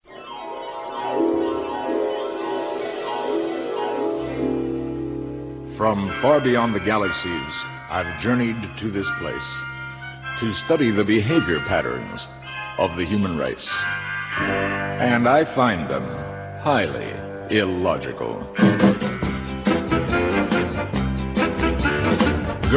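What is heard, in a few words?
Brass Playing Mishaps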